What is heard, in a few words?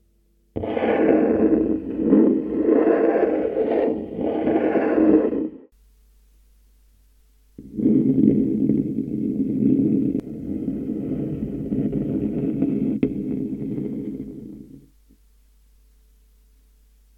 scratching table